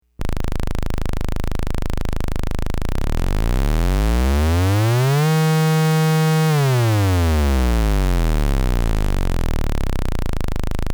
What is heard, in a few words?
sweep